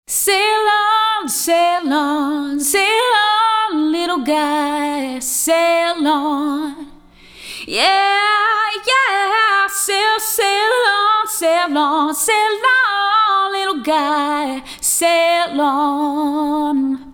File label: Lead Vox